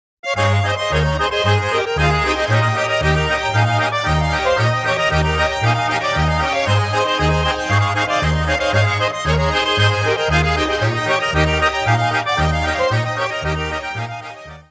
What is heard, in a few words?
Schottisch